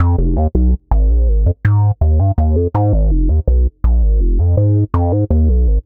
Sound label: Synth Bass